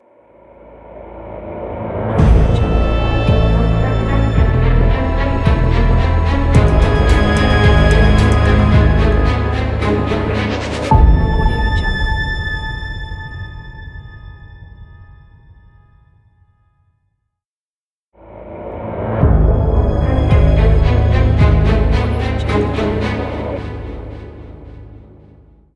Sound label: cinematic crime drama logo; alexanderrufire